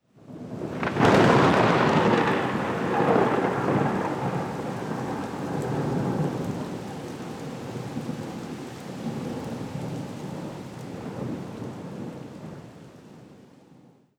Thunder; HauntedBloodlines

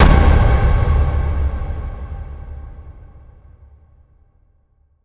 Hit; HauntedBloodlines; SFX Producer

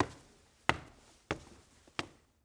Stairs Up Wood; Footsteps; HauntedBloodlines; SFX Producer

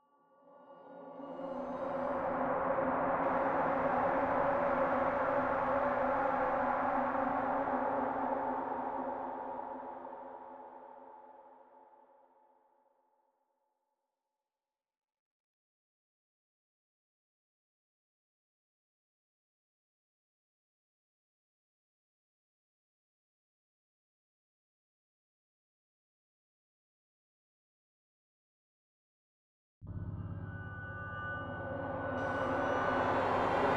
INSTRUMENTS; Nine Inches STEMS

